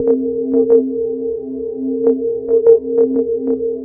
bowl swish, Poly-6

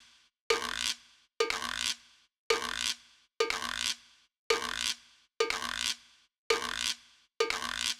Cb-Gu Loop 120, Perc Loops, Loungin House; Equipped Music

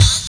OP HAT

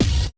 kick, Roland MC-909

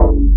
FUNK BASS

